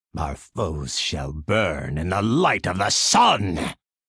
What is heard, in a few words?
Intro Burn; Kael